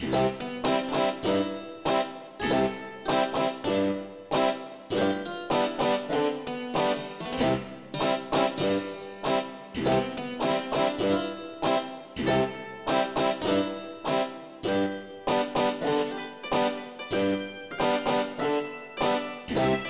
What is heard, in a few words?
ACCORDIO